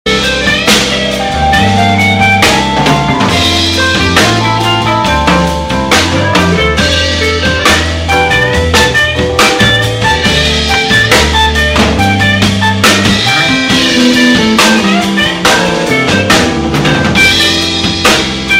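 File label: Fender P Bass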